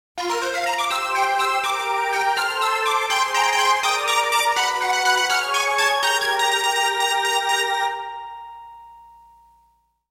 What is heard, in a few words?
Fanfare; N64; Mischief Makers